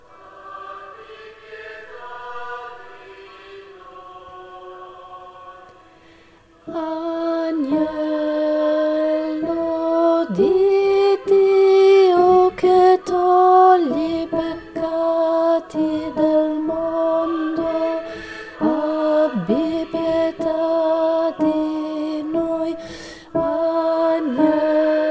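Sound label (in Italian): Agnello Di Dio, contralti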